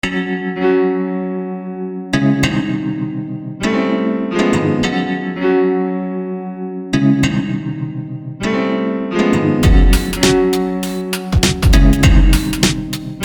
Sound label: Noise Hip